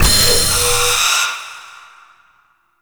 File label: Item Found; Warframe; Duviri; Owl Recon